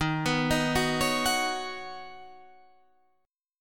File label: Eb Minor 7th Sharp 5th Eb Guitar Standard; arpeggiated